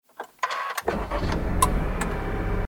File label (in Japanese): 車のエンジンをかける音; 環境音